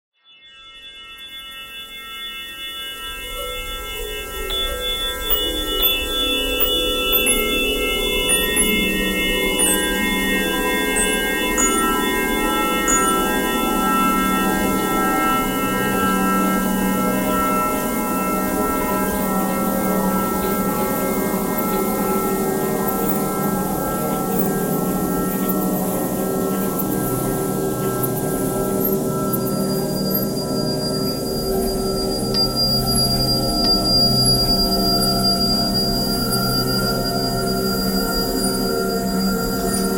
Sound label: vox breathing thread noise all